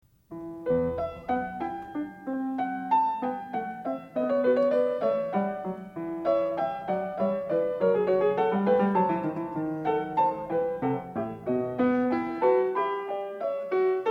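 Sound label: Sinfonia in E, piano; BACH; SINFONIAS; Sinfonia 7 in e; BWV 793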